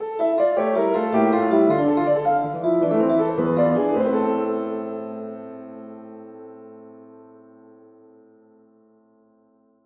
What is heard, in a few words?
Baroque Style Harpsichord Phrase